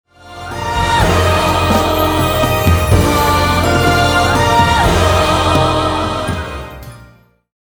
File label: Romantic Meeting Crecendo